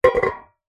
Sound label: tin metal cylinder lid on set down on concrete